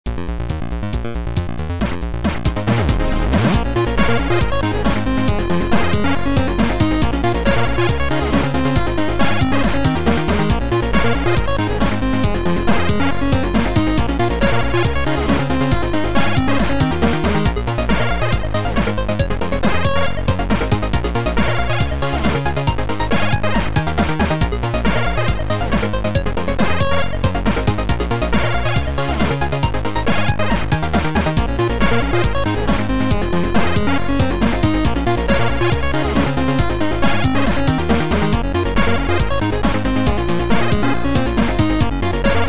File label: Chiptune